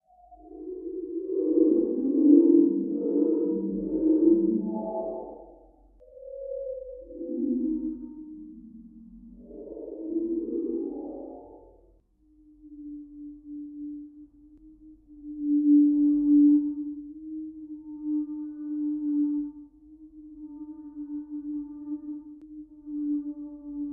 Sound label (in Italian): usignolo; 7-usignolo; I 7 CANTI